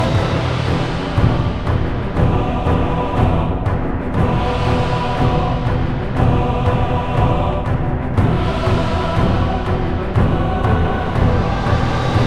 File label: Final Boss; Lava